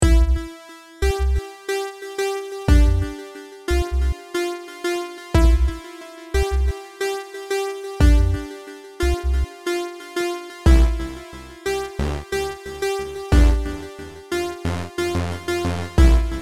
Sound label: beat